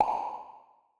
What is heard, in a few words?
Reverb